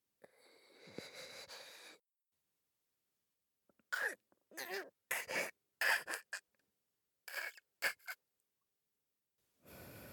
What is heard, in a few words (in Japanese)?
子宮を刺す